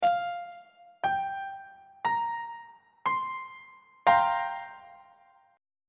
b sharp dominant 7th suspended 4th 1st inversion on treble clef